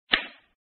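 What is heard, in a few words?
doudizhu common fapai, dealing cards; pk nanchongdoudizhu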